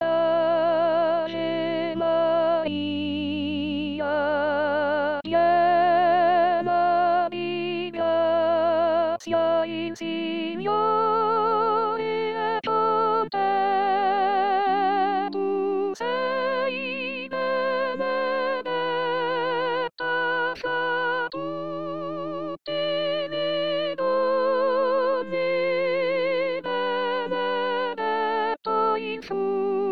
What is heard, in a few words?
Ave Maria, Soprano